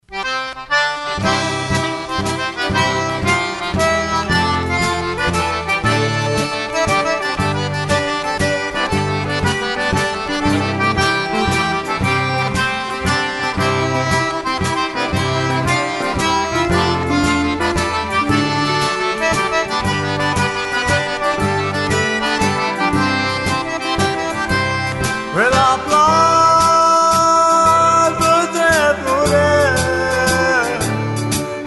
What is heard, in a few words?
Waltz